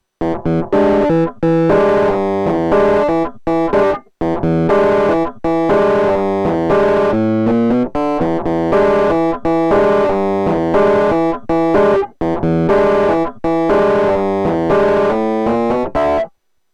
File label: MOD Devices MOD DUO X Epiano GX TubeS